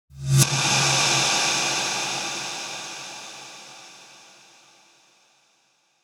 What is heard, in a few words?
Cajon Reverse Verb 142 BPM; Psytrance; Ethno Box